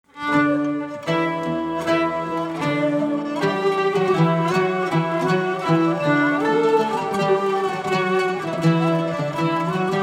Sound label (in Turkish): bayati pesrevi neyzen; emin dede